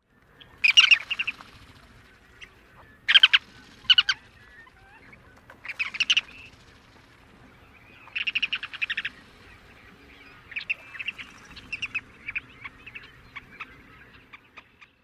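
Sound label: alarm calls of migrating birds, Ruddy Turnstone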